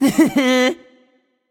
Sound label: tongue; smite; voicepack; janus